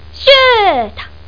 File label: shoot